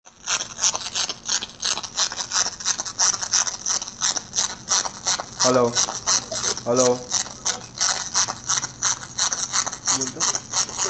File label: VoIP noise